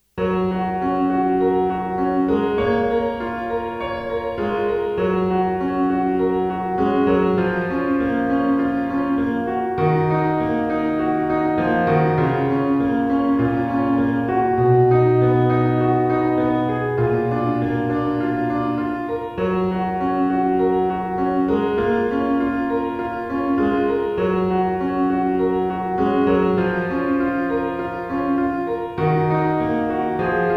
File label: piano pop